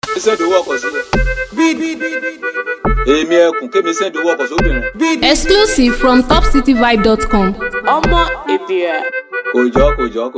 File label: Cruise Beat